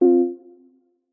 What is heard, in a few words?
multimedia game sound soft warm subtle single tone